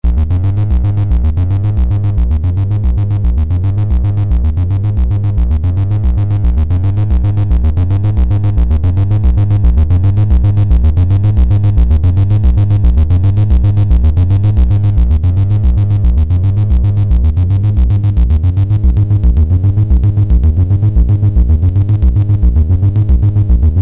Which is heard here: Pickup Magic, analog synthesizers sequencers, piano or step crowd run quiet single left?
analog synthesizers sequencers